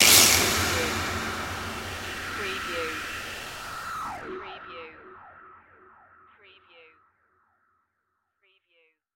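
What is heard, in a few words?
Production Element Hit Distort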